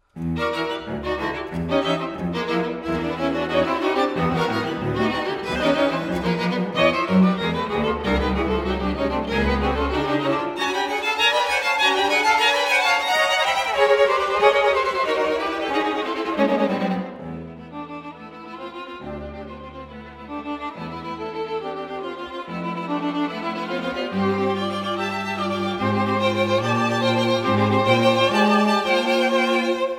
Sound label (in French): Quatuor a cordes; Choveaux